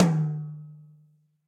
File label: Tom High Deep Snare Dynamics; drum hits; Ableton; Factory Packs; Session Drums Club; Standard Hits